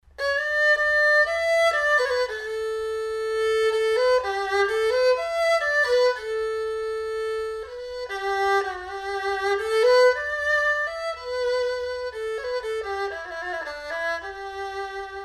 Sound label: erhu